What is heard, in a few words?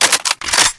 rifle bolt; weapons; deluge